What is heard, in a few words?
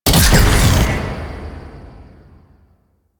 Layer Alt Shot A, Shotgun; Phantasma Prime; Revenant